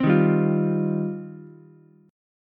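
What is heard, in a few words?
E flat 6th Add 9th E flat, down, Ukulele, C6; strummed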